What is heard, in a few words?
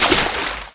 ollie water; terrains; Tony Hawk's Project 8; XBOX